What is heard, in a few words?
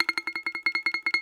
BAGLAMA T1JR; E-MU Producer Series Vol. 7 – Old World Instruments